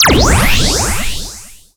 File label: Zipper; Nicktoons Nitro; arcade